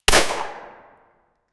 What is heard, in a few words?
single mp5 9mm gunshot